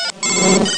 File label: Panel Enters; Let's Discover Michigan!; Geo